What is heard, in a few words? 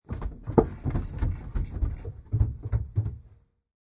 Wood Steps, Footsteps moderately pounding down wooden stairs